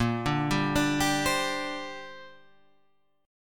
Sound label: A sharp 6th Add 9th, Guitar Half Step Down